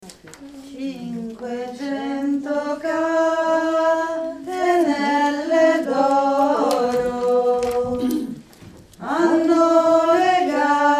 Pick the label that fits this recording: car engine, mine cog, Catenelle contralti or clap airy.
Catenelle contralti